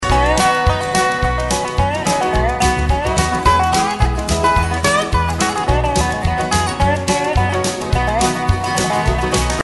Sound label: dobro